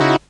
chord one shot call B, Classic Chicago House; Sample Magic; Chord Shots

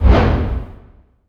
cinematic impact wipe

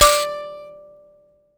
Finger, Prepared Kit; Battery 3 Sample Kits

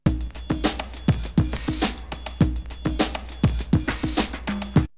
Drumloop; ZERO G - Datafile DISC 1

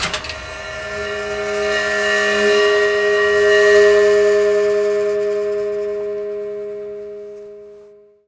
Prepared Drums

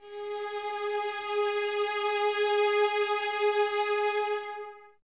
Violins Soft G#4, String Ensembles; Miroslav Vitous; 11 Violins

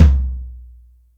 TOM, Acoustic Tom; Yamaha DTXpress

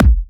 Bassdrum

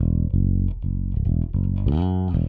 Rock, Bass; Bass X

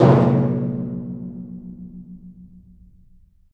Af. Hand Drm, Ambient; Roland LCDP14 Africa VOL-2; Ambient Tar

